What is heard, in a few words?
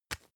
tissue tap soft